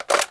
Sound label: fn2000 clip in, weapons